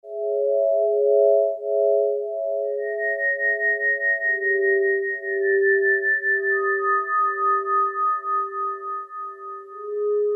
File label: synth; syn - Burma; E-mu Proteus FX